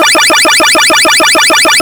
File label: Background